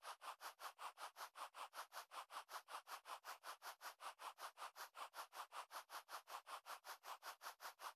Buzzer Fly; gamerip

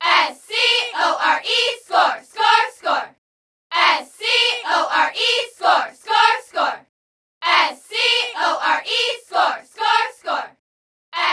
Sfx Basketball

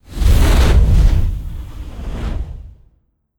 Hawk Fire Burst

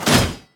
Shield Prepare shield; Pecheneg

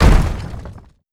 Zombie Guard Club

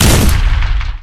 Explosion; Nickelodeon Super Brawl Universe; Android

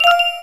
Combo; machi koro with everyone; switch windows

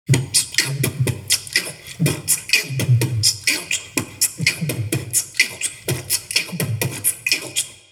beatbox 16 beats

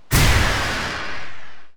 Fireball Cast; Grimm

